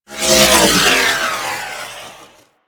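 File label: Space Fighter Projectile Whiz By; Space Fighter Gox Agent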